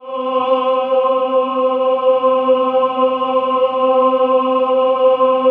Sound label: CHOIR; Best Service; Robot Computers Akai